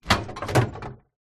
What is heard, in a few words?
household drinks fridge open